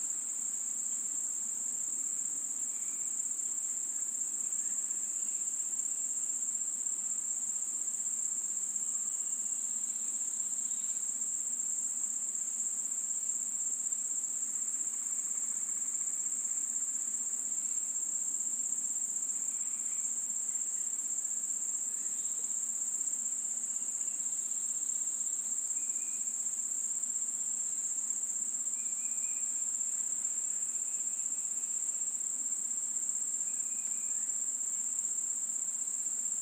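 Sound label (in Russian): звуки лета в деревне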